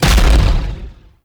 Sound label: Biped Railgun Explosion; Warframe; Corpus; CorpusRailjack